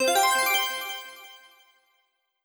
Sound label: accent brilliant middle slow